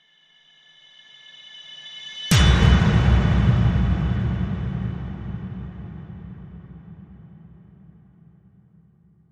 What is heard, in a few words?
Slow Rise and Hit